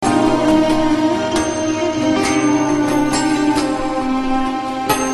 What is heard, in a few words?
Game Tune